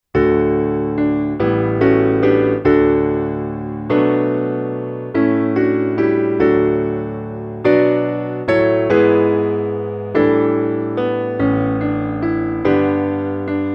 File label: Amen piano